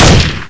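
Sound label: m4a1 unsilenced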